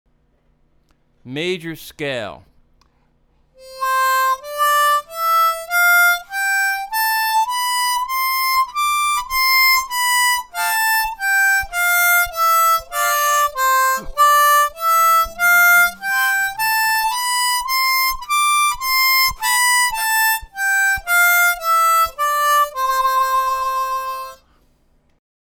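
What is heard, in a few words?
Major scale, harmonica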